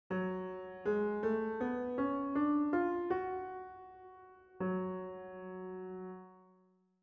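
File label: F sharp minor scale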